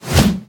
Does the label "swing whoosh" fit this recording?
Yes